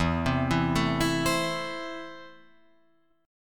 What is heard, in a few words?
E Suspended 4th Sharp 5th, Guitar Open F; Esus4#5; arpeggiated